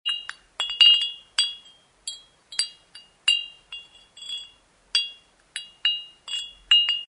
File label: chime ceramic wind